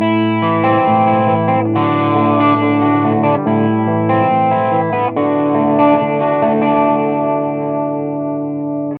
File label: picking